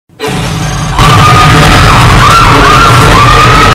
jumpscare sound effect

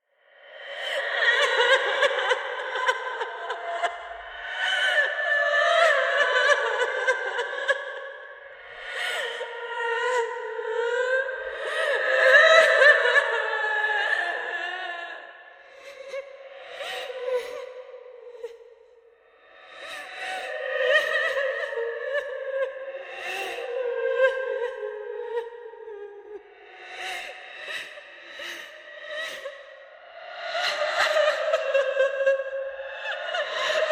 very creepy ghost woman

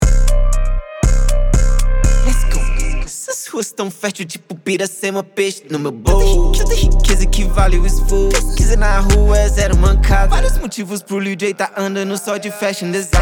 rap trap

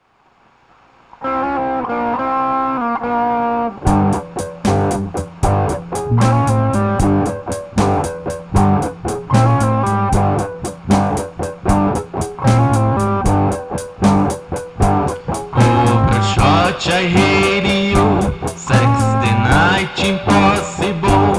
over blues

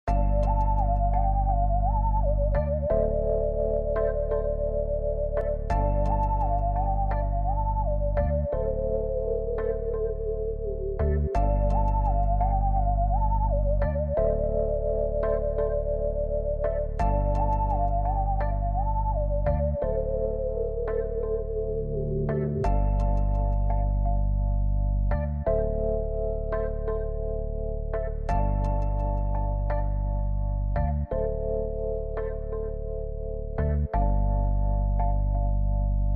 Free Lay Back Hip Hop Loop Sample D Minor 85 Bpm

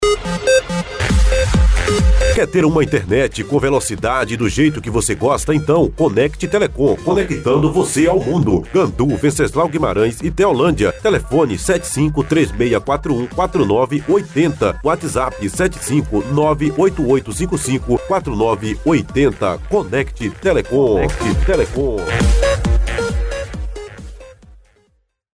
jingle; massa real

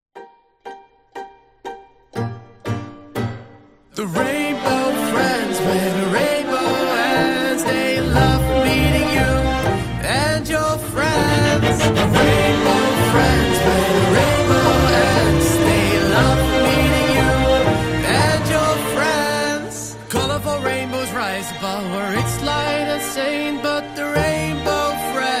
violin version horror

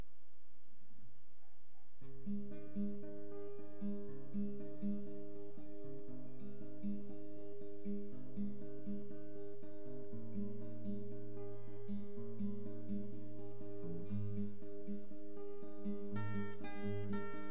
solo fail acoustic

